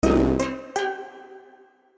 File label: Sms